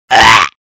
Vomit Blargh

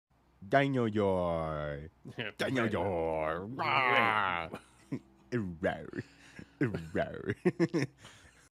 RAWRRR; cute

